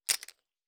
Poker chips dropped on to stack; Playing Cards & Betting Chips; Timothy McHugh

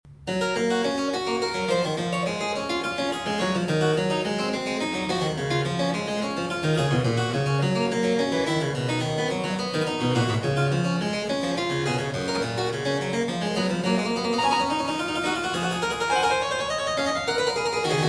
Goldberg Variations, Variatio 2 a 1 Clav; J.S.Bach; BWV 988 Variatio 20. a 2 Clav

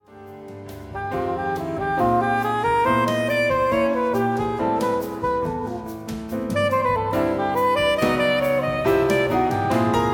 Sonata for Saxophone

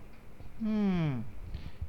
Hum

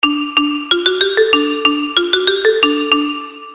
baby bear theme

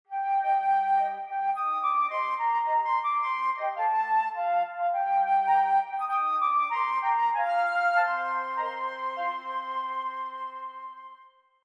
Melody

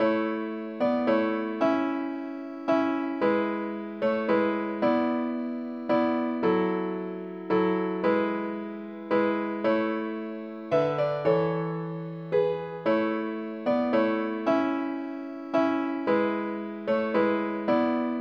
Piano Sonata; No. 11; Tema

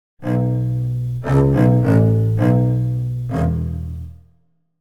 papa bear theme

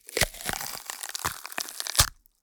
crunch rip, gore; SoundBits

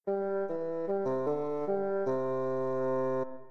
baby bear

